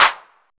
wet hand clap sound d key, Royality free hand clap sample tuned to the D note; single hits one shots